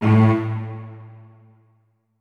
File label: notes, keyboard strings; Hideout Furniture